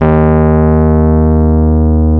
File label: D#1, Electric Pianos; Wizoo